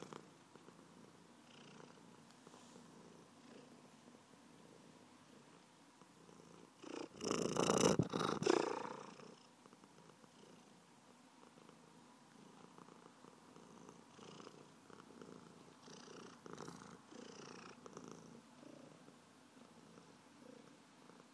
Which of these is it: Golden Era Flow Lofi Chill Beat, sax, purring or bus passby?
purring